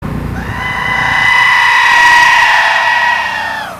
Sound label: goat screamin